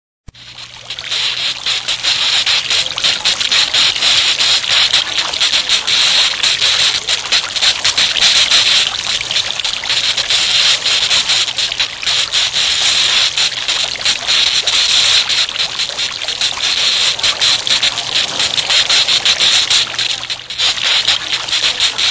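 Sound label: bird colony by a stream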